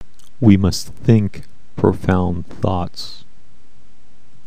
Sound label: thought